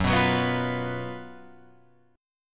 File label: Gb Major 7th Suspended 4th Gb Guitar Open F; GbM7sus4; strummed; JGuitar